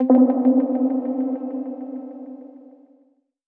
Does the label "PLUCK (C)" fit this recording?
Yes